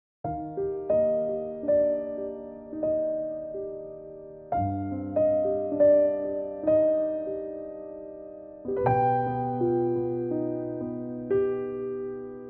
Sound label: Eb Piano